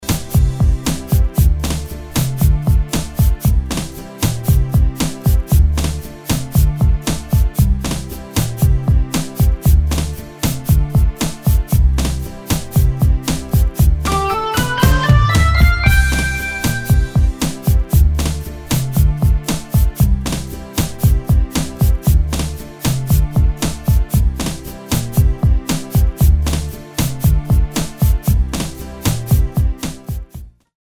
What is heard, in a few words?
Karaoke Song